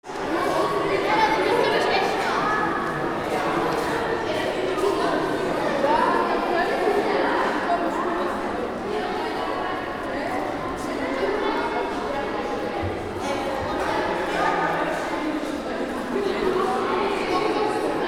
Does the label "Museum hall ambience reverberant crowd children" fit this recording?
Yes